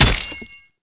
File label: sword flesh; Tribes2; ironsphererpg